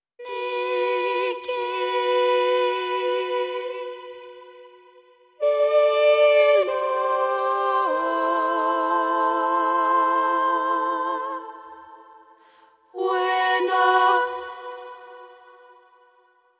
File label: Choir